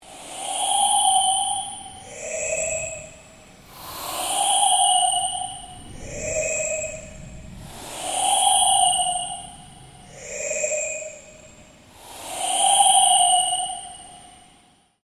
Ambient Drone Slow Sweep

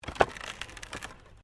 WOOD PLANK TWIST

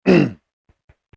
Man Grunts; Grunt Sound Effects; human sound effect

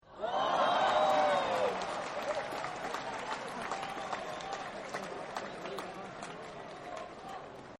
Applause Woo-oo-Woo; Applause Woo-Hoo; Partners In Rhyme

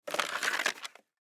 Hockey Helmet Up, Hockey Helmet Pick Up Cement; SoundEffects-Blastwave; Sports

